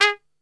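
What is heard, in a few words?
G#2 piccolo trumpet short, Brass; Best Service; Brass Super Section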